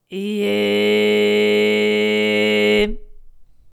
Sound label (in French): BV reguliere M1; les effets simples